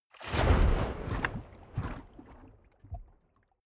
waves glug

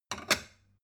stone bowl shelve short

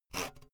saw use short light

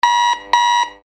warning signal

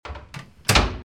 door lock

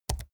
keyboard laptop key press fast soft

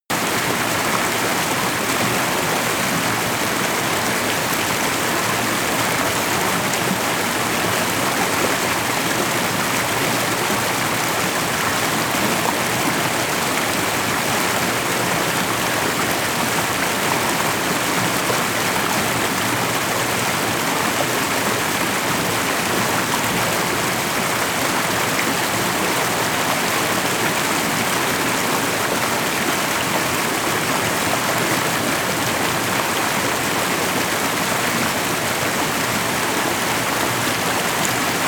creek large

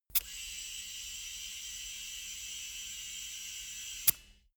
tire pressure control deflate

